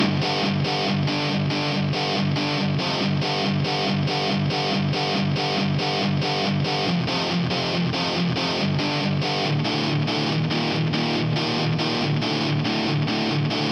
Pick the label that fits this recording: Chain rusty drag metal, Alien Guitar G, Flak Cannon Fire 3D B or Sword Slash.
Alien Guitar G